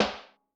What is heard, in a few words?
Filtr rim, Yamaha DTXpress-II snare